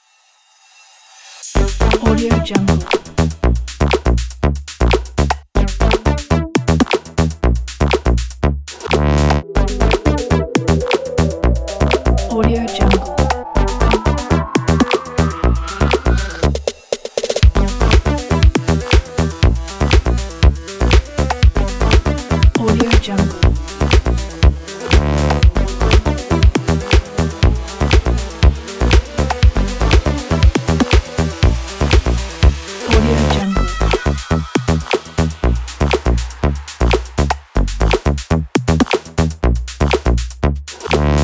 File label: hypnotic night